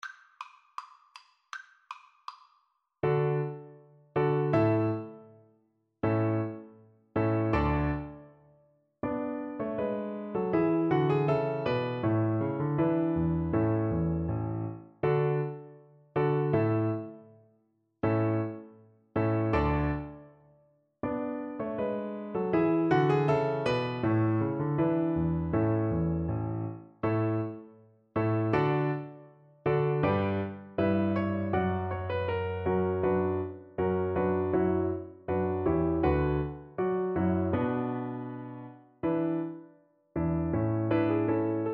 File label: bourree, Alto Recorder